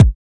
rich mids kick a sharp key, Royality free kick drum sample tuned to the A# note.; kick drum samples